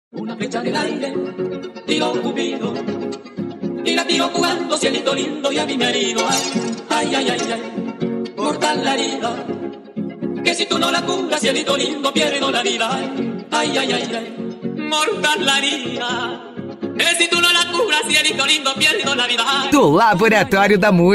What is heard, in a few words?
funk